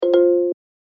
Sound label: notice default